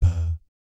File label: BAP E2, Vocal Jazz FX; Spectrasonics; Vocal Planet; JAZZ COMBO